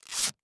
wrap; Rust

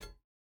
footstep boots metal jump start; Rust

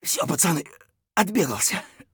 hurt near death; Escape from Tarkov; scav1

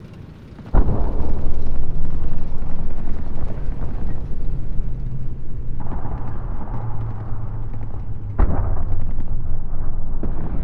warfare amb tanks high

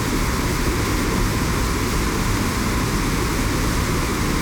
FW-190D9 Plane Wind In L; DCS

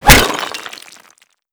impact vase; weapons; Modern Warfare

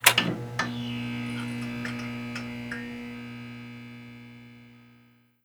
switch auto lights on

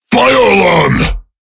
Fire Alarm; Megatron; Alarms; World Of Warships; Entertainment

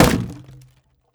wood; Rust

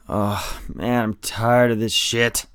tired; Escape from Tarkov

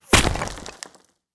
concrete impact bullet; Rust

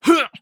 hit; Escape from Tarkov

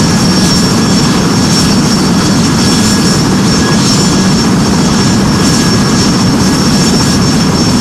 engine tvd 1750rpm; War Thunder

